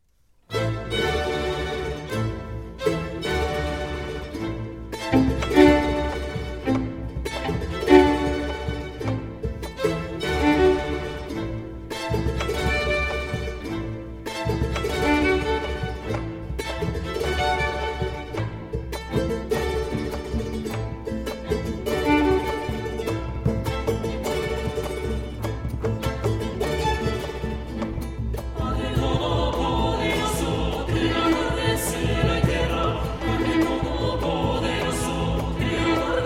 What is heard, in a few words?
Credo For Solo Violin, Vocal Quartet, Percussion, Charango, Guitar and String Orchestra